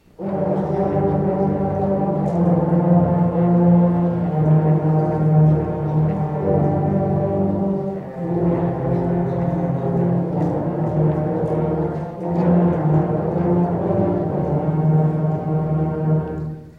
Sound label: Low Brass